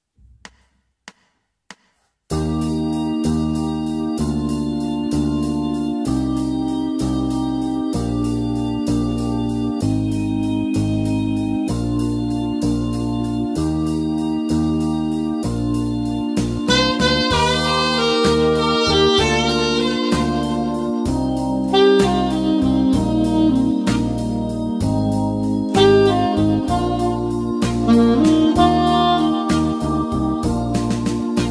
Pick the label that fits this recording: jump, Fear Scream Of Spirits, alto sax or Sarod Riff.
alto sax